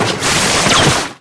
umbrella mine; garrysmod

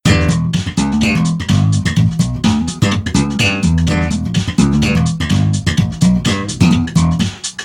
cool bass; garrysmod

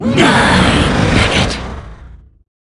banshee taunt; garrysmod

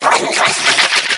parasite fly; garrysmod

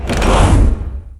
witch curse over; garrysmod

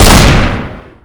jackhammer; garrysmod

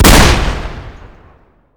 revolver; garrysmod

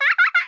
giggle; garrysmod; lara

